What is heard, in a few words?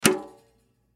Drum Snare; Snare 14 Inch Pacific Throw Snare Off; Drum Kit Sound Effects